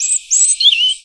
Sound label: Birdsong Bit, Oxford Island Lough Neagh